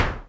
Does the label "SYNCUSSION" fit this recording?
Yes